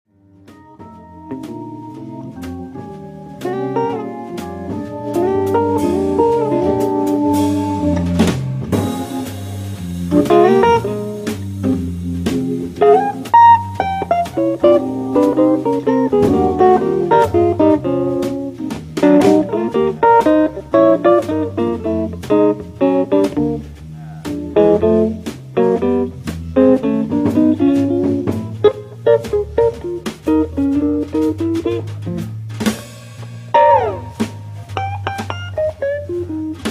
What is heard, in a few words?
blues